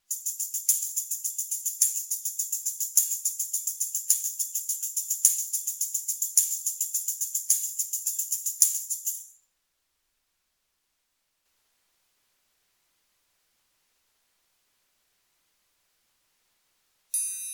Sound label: Guess the Instruments